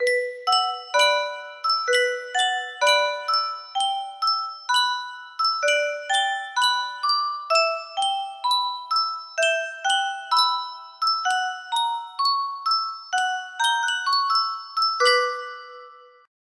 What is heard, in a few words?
yunsheng custom tune music box